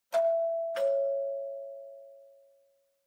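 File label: Doorbell Mechanical Tone Far; ドアベル 機械式 トーン; 遠い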